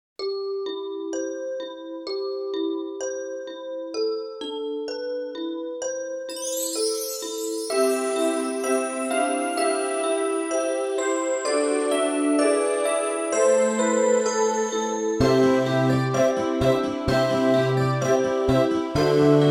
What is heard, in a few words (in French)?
Reve playback